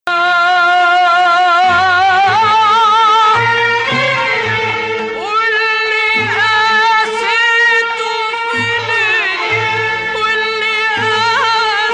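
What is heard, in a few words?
Ajam Hijaz, Arabic music; Ajam, which resembles the Western Major scale, has two distinct versions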